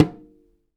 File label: African Perc; Spectrasonics; Supreme Beats; African Contemporary; SB Afro DRMs